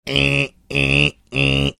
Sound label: making 1980s digital alarm clock sound